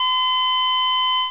time up flatline

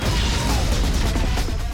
Exclamation; Tomb Raider Exclamation 2; Lara Croft- Tomb Raider- The Cradle of Life

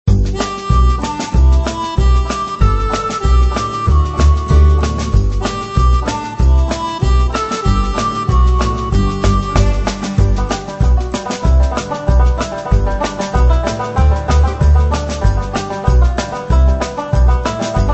loop; jumping jelly beans

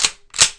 Load Rocket; Ruckus

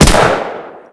m1 garand fire; weapons; Spearhead Demo; MacFormat 2003 June

